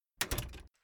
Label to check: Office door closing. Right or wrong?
Right